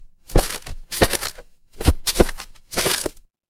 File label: footsteps concrete